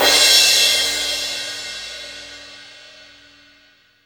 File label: crash; East Collexion; Drum 1 Dry